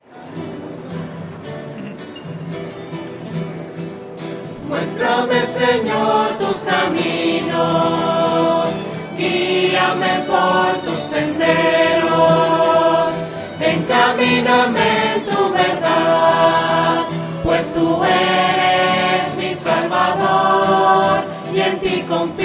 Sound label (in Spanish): voces; muestrame sennor tus caminos; misas 2012